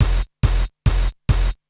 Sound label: DRUM LOOP BIG BT 140; eJay; BigBeat